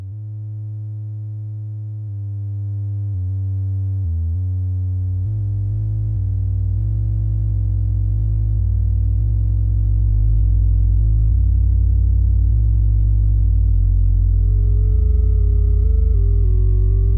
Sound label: infinite swarm of triangles